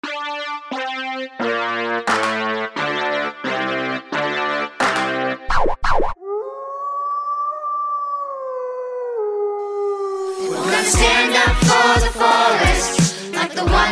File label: forest rap